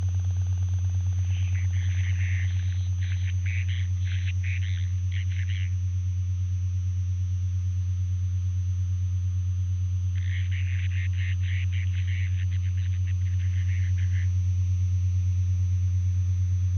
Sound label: Short Waves; Ghostwave; Long Distance; Wave Farm